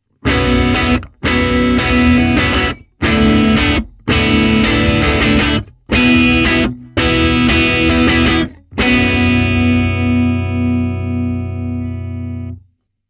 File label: Guitar Box - R121 Bi Direct - Edge modelled; bidirectional (osmička); edge duo srovnavaci test